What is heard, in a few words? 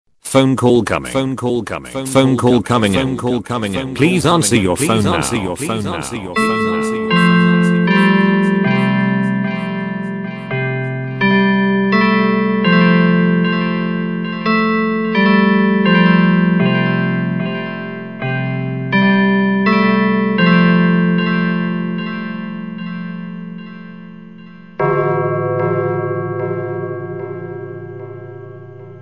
Big Ben